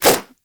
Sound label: nunchuck whoosh; Warframe; Infested; weapons